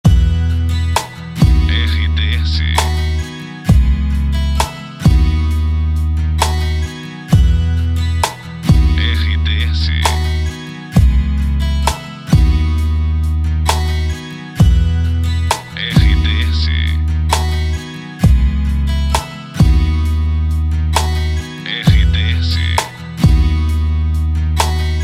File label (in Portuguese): trilha para locução adulto; Web Net; Web Rádios